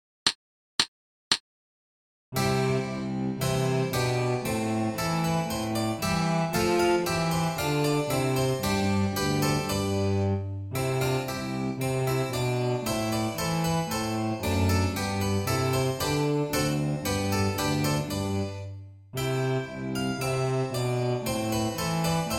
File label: petite gavotte basso continuo flute